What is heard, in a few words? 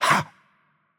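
Attack Light, Czech; bloom minigame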